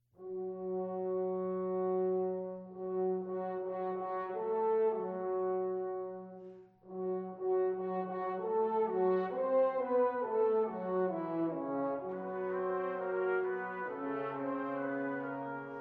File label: Concerto For Trumpet, Brass Ensemble & Percussion, Adagio